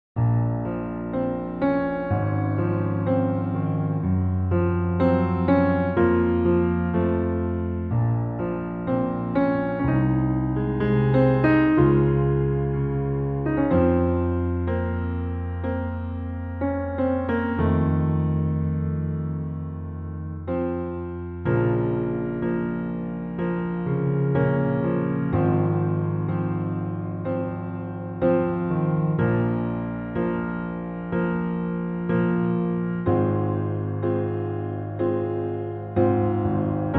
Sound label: piano